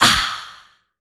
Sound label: Voice HiHat; Roland BK-5